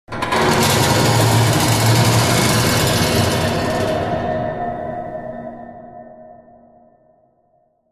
Metal Cage, Scraping along the bars of a metal cage with atmospheric echo.; Materials